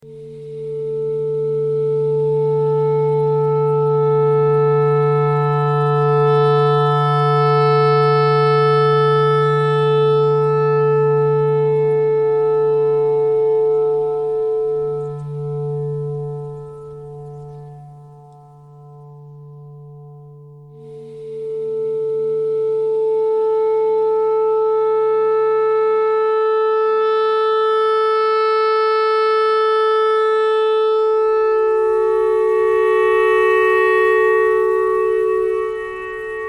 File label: clarinet notes e clarinet thread noise